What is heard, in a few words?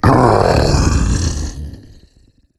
die; the monster plane; kolmods